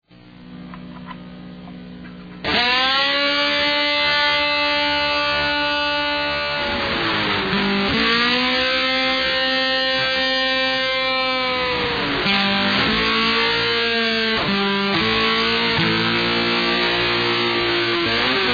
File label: Lap Steel